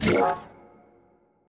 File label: Counter; eggomatic